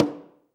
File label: CONGA, ST CONGA; AKAI S-Series CD-ROM Sound Library VOL-5